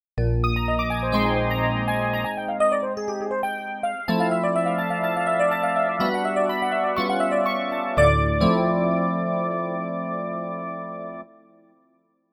Classic DX Rhds, E Pianos; PC3

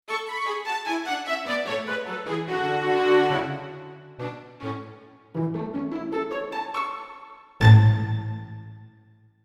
Winds and Str; Orchestral; MP10

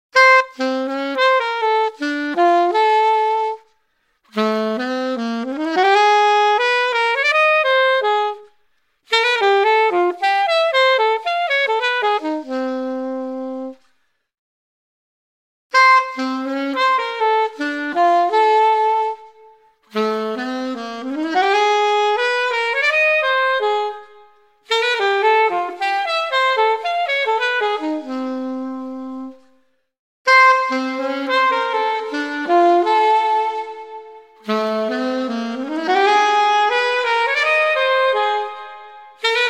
Alto Sax

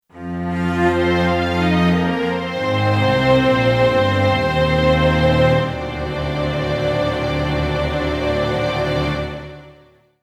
Octave Strings; Orchestral; MP10

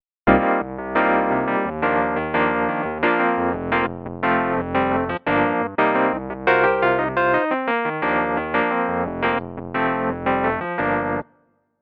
Sly Ballad; Classic Keys ROM Option

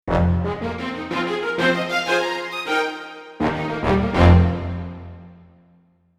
Many Characters, Orchestral; PC3

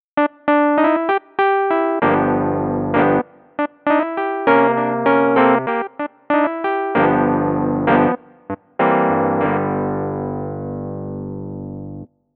EP, Electric Pianos

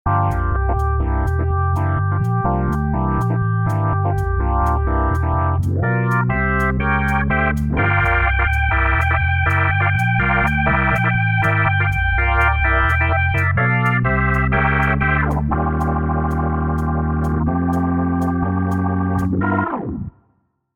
Boston Screamer, Organ

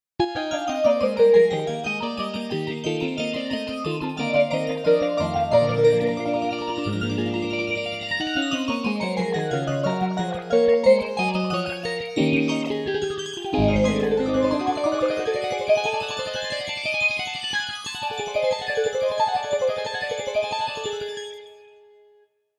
Spaced Out Bach; Classic Keys ROM Option

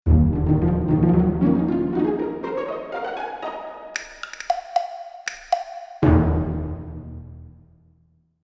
Pizz w Perc Up Top; PC3

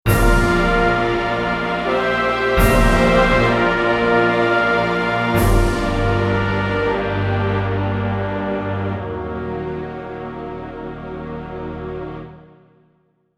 Gothic Climax, Brass

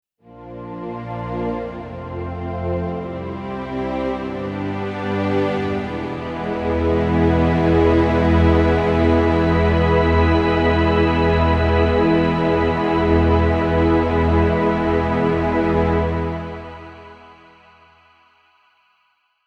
Quadra Pad, Synths; MP10

